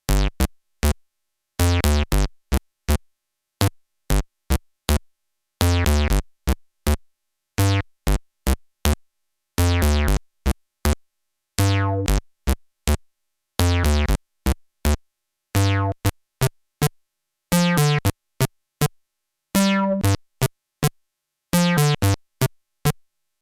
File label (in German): Puls Bass mit Resonanz